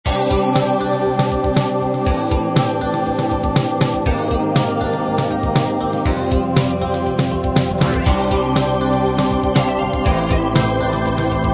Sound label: fatback groove intro